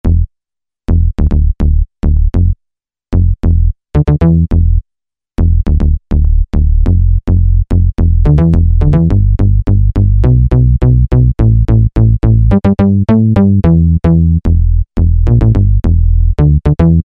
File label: Solid Bass; Roland SR-JV80-11 Techno Collection